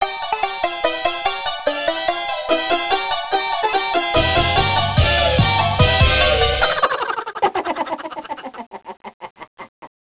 Final Result (Game Over); escape kids arcade gamerip 1991

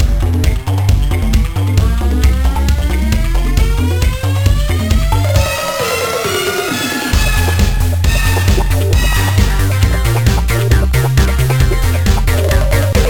Disco; Urban Yeti; gba

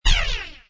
Zap; yet another pixel dungeon android

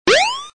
Jump

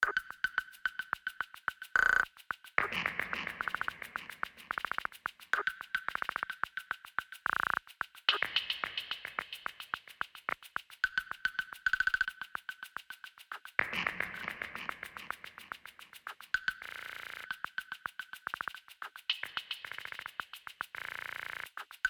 Loading up Hi Perc; sniper ghost warrior 3 gamerip 2017

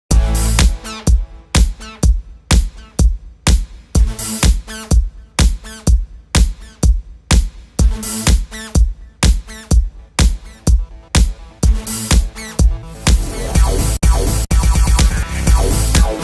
race; Ferrari GT Evolution

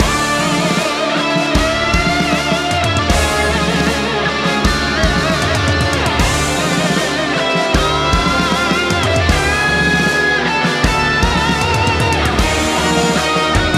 BOSS Super Shredder Intro; Teenage Mutant Ninja Turtles Shredder's Revenge

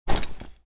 Gate Open

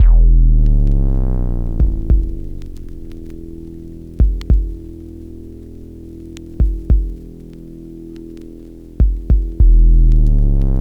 Death

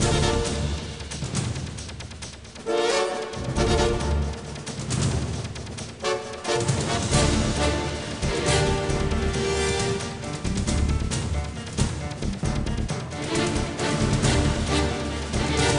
ALERT MODE SHORT; Headhunter; dreamcast

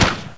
fire turret

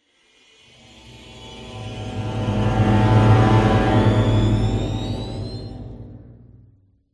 Stinger; Cannery Stinger Geist

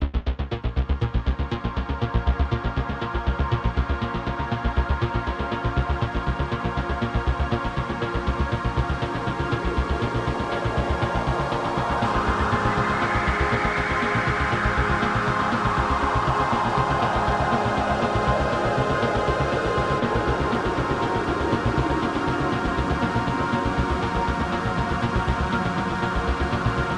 Pads Loop; Headlander

